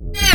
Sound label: On Select; VR Development with Unity and Meta Quest 2; interaction advanced